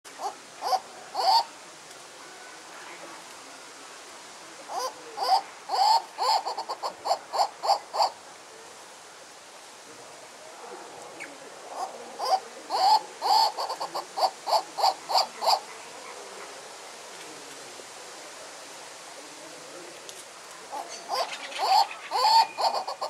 singing bird turaco

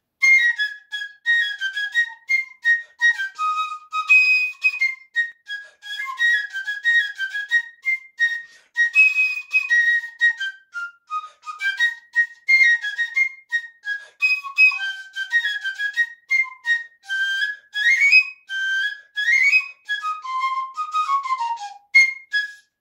Piccolo

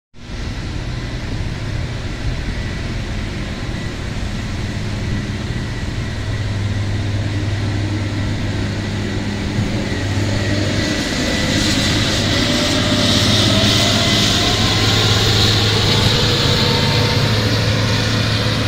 lenj, لنج

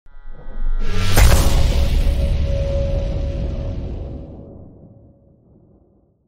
impact

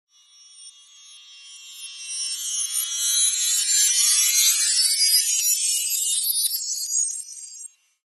bizarre spooky teleportation

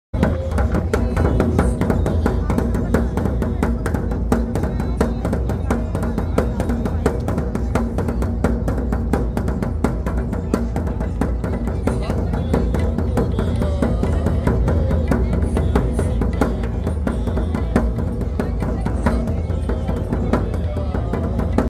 Nagara, ساز ناقارا

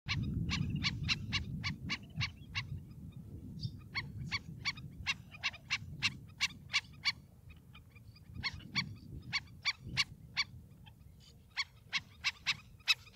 Stilt

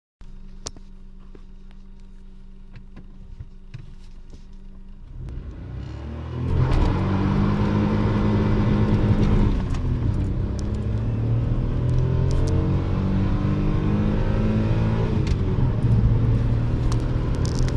daewoo matiz

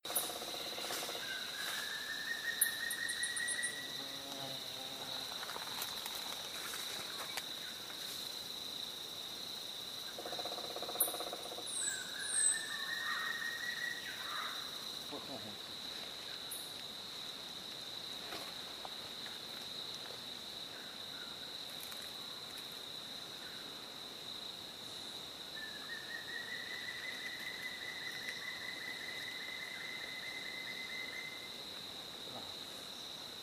Ringed woodpecker

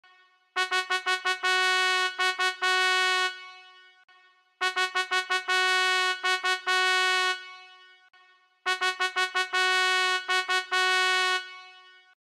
school bus

